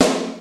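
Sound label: LIVE SNARE, MPC-60 DRUM; Greytsounds VOL-1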